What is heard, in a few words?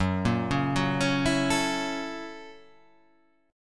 F sharp 7th Sharp 9th